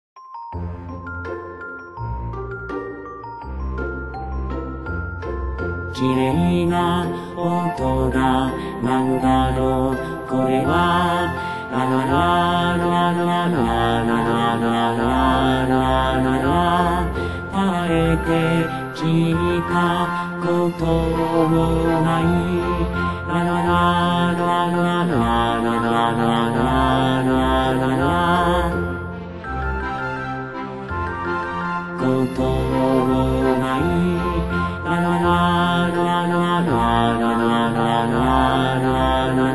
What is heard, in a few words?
bass